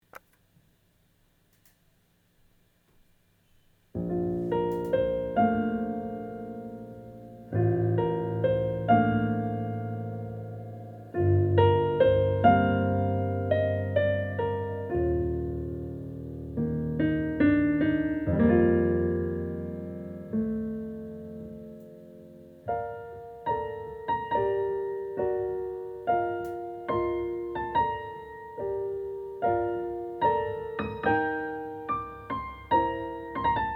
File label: Romantic Wedding March, Classical Piano